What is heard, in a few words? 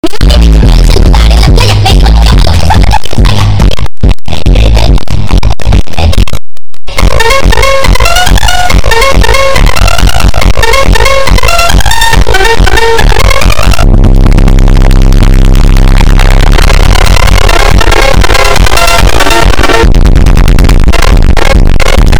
funk Superbass